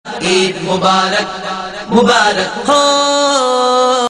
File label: Eid Mubarak Sms Tone; ringtones